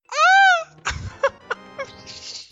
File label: duck scream